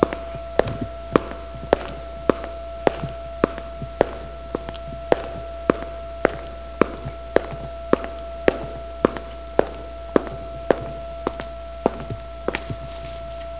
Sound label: walk